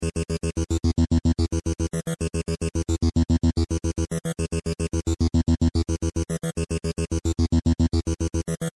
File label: synth bass